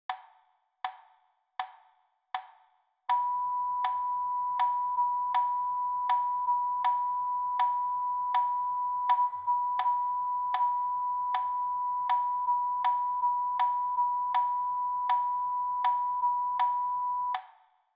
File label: Rhythm Practice B note